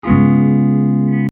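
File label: Notes C#7 Up; Hylics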